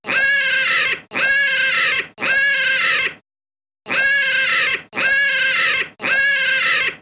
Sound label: pig squeal